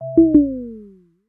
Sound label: critical low battery; OnePlus OxygenOS 13